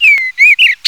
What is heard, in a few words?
western bluebird